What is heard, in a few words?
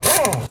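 Impact small, air tools, industrial; Air toolks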